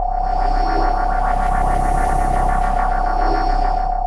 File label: Sci-fi UFO Loop; SFX Kit; Sci-Fi Loops